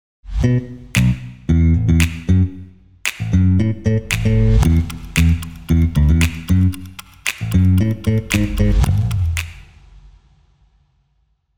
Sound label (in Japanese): ジングル